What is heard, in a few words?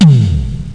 SHIP HIT